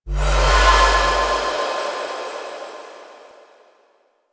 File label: Respawn Stone Activate; Ambience; Props; Warframe; Duviri